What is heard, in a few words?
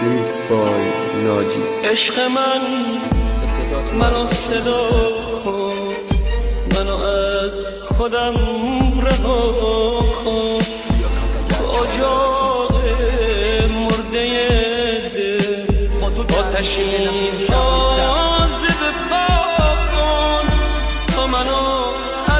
rap Remix